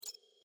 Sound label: click